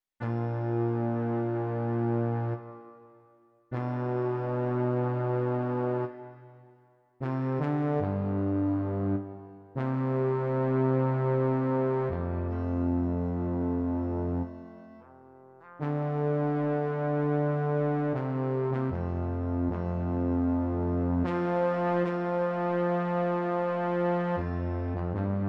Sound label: When the stars bass